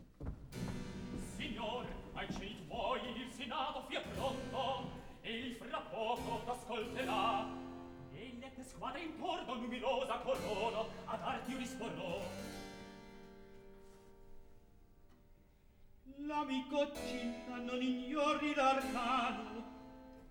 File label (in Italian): Atto Secondo Recitativo; Aufidio, Silla; Mozart; Operas; KV 135 Lucio Silla - 1772